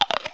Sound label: Eat; FRACTIONS PIZZA